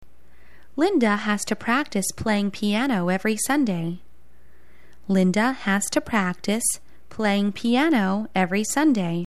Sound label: piano